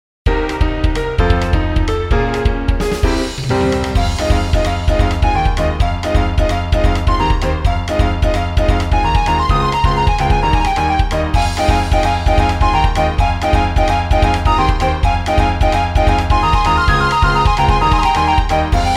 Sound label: fue Battle